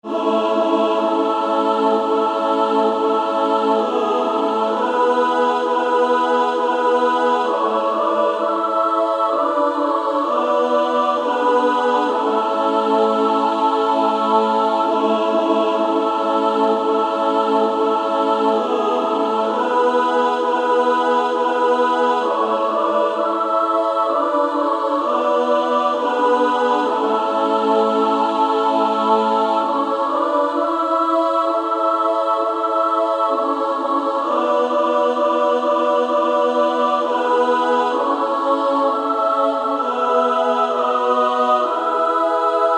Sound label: Female Choir SSAA